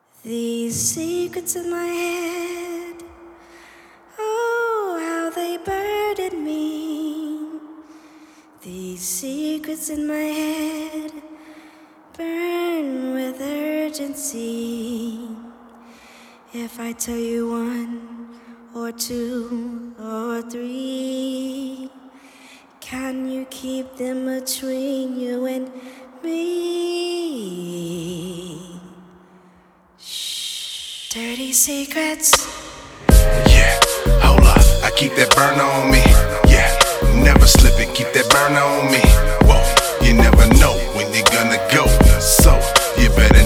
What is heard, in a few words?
Hip Hop East